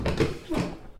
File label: Chair on Wood Sound